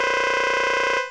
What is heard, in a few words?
gauge fill; midori-school